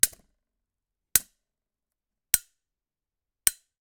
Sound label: Generic Button Metal Small